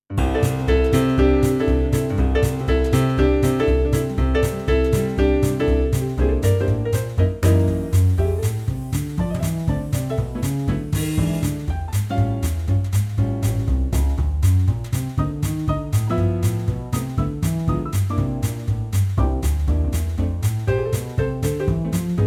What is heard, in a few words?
no trombone; key - F - vocal range - G to A; Swinging Realtrak arrangement